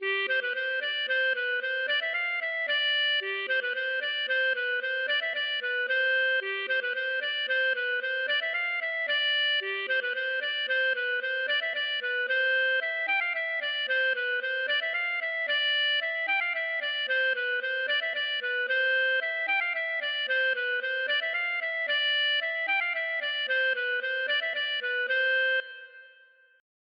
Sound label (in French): bourree; Du Pere Blandin